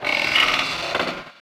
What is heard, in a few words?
seesaw up; Tony Hawk's Project 8; XBOX; houses